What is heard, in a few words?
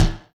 football Bounce; HeavenStudioPlus; double Date